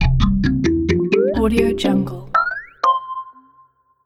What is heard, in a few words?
zany level up